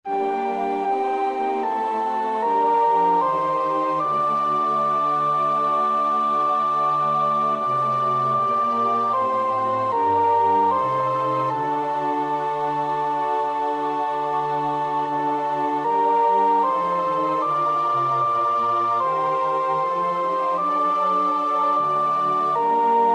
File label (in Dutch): sopraan, koorpartij oefening; Bach (Johann Sebastiaan); BWV 005; 7. Führ auch mein herz